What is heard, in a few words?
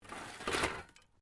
METAL SCRAPS MOVE